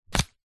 household book minature set down table; zapsplat

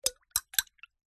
spoon stir hot water tea or coffee in mug; food drink; zapsplat

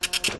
Vintage Dot Matrix Printer Triple Burst; Skyes Audio

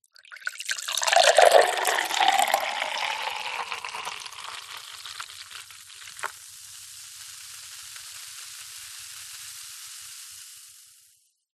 food drink cola pour into glass fizz; zapsplat

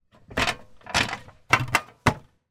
foley footsteps up step ladder metal; zapsplat

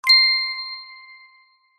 magic wand ping; zapsplat

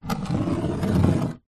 foley stone scrape wooden surface; zapsplat

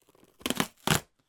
cardboard sheet rip; foley

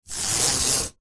household blind pull

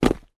footstep dry forest floor; foley

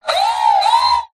science fiction robot movement short burst

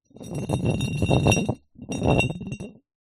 carboy demijohn roll hard ground; zapsplat

